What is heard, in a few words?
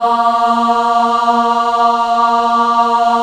A#2 women ah, Choir Acoustic & Synth; USB Soundscan vol.28; AKAI; WOMEN AHP